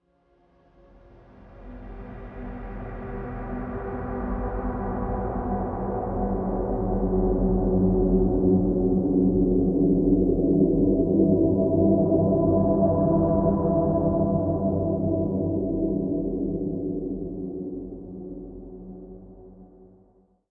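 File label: Ambience Drone Music; Deadcore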